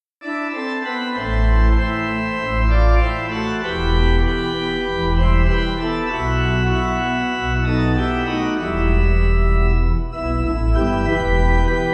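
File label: pipe organ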